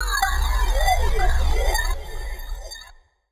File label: TECHNO EXTRA 140